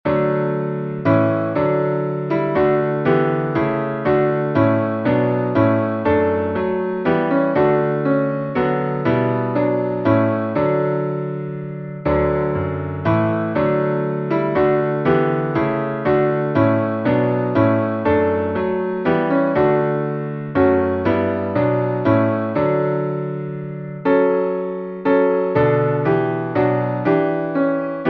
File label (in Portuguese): salmo instrumental